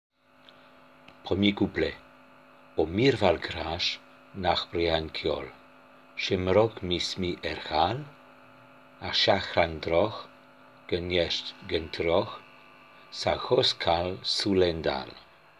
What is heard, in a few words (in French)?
1er couplet; o miorbhail grais